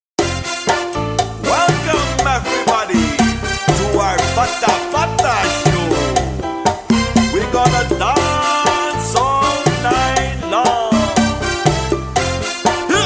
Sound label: Cuban Cha Cha